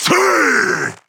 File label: Grunt Attack